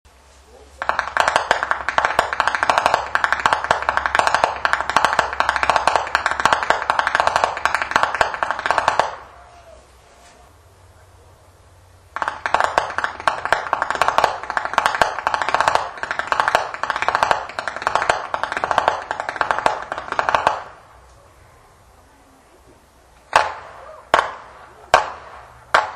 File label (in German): schnalzen, flachgau; bergheim